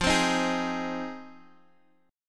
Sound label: Ab Major, F, guitar chord; strummed; Ab/F; JGuitar